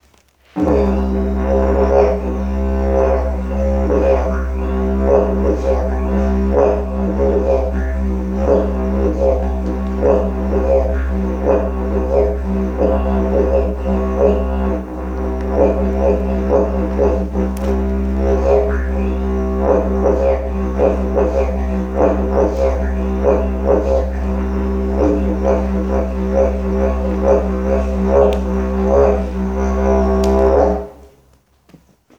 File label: diigerydoo